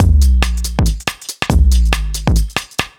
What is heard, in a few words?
Kit 7 Odd Sig 140; off the grid